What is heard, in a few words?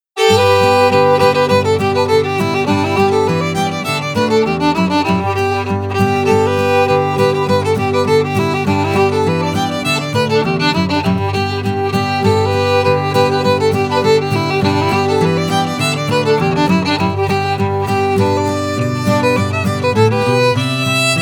crickets lullaby